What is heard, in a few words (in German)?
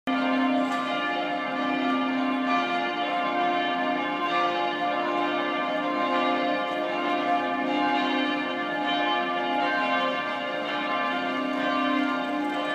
Glockengeläut Frauenkirche in Dresden